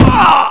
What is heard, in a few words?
Small Impact